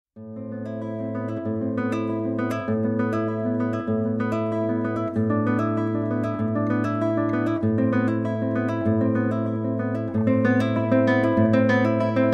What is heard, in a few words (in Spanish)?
Preludio en Milonga